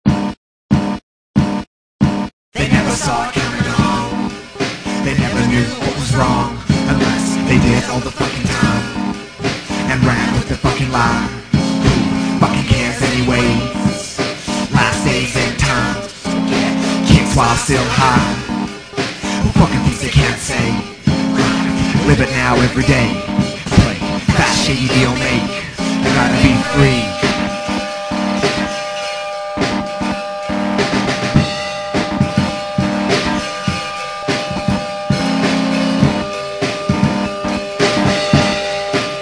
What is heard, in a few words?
fart